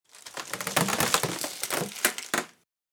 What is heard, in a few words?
Designed cinematic wood textures crunchy pressure